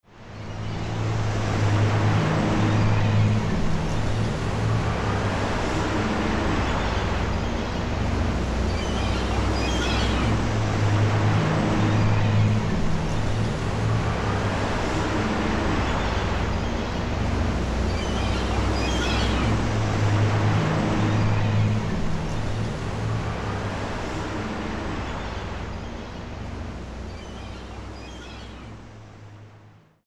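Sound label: ambience hitchhike; Wario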